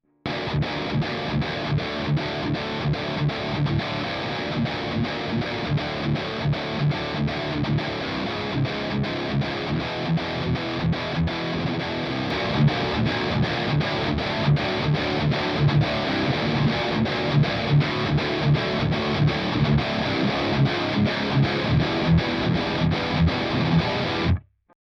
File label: riff